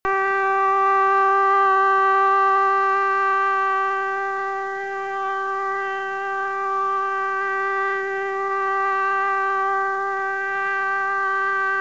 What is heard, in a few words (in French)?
signal fin alerte